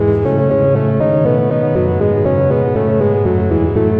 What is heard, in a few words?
120 mellow synth circles; SYNTH AND ELECTRONIC LOOPS